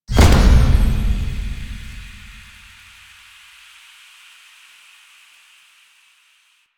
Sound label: Mask Pop Smoke; Deacon; Warframe; The New War; Intro Throne Room